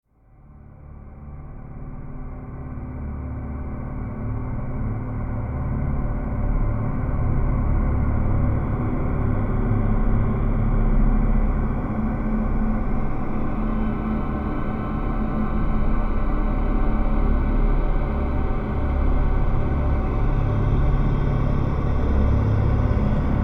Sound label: greece ambience